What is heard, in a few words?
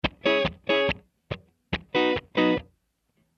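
Ska Guitar; Loopology; Ska Till Ya Drah; Lounge & Reggae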